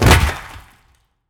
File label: strider steps detail; creatures